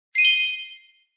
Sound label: stamp Earned